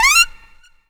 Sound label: SAX RIF; E-Lab; X Static Goldmine 2; akai; SAX RIFF2